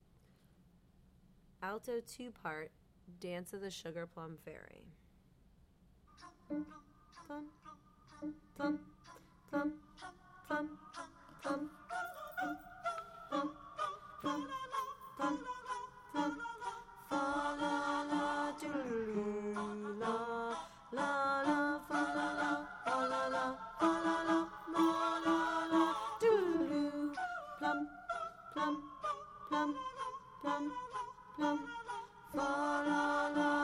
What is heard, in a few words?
Dance alto